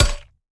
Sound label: Weapon Ceramic